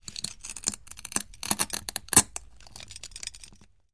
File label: Glass Grinds Creaks; windows & mirrors